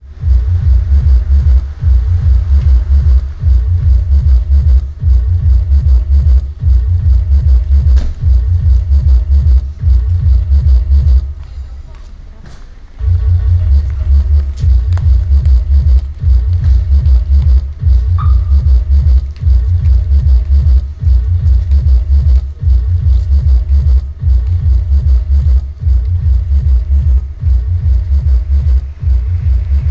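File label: sounds of a car alarm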